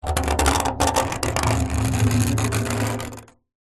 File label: foley metal spike small scratch rusty metal trailer panel; zapsplat